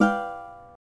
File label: G Ukulele; Notes; Scratch